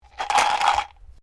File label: Dice medium shake plastic cup; Casinos; Variety of dice sounds; SoundEffects Foundation2